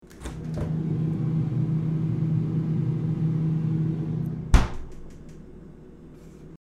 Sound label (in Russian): morozilinik